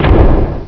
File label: door slam; Prince of Persia